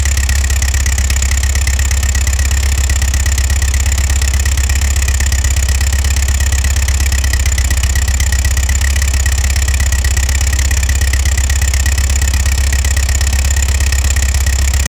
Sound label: 2 strokes engine 50cc, engines